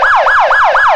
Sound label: yelp, sirens; federal sig tm